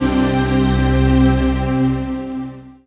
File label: PAD, Dance Mega Synth